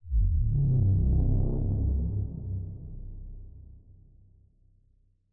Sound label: bass; music